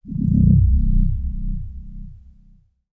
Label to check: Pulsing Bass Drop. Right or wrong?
Right